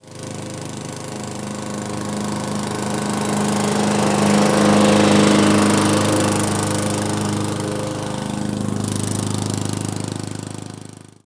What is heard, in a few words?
mower